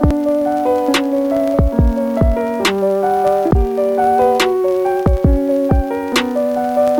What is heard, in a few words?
Background Music Loop 68 bpm